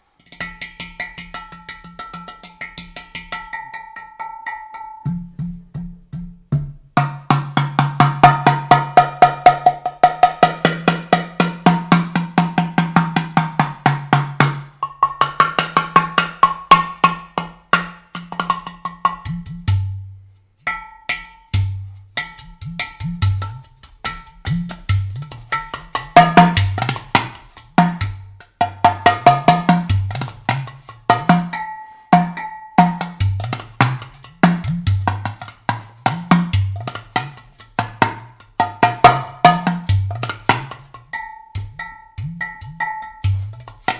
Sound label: udu drum; udu coussin 30 cm bata (avec 2 tambours)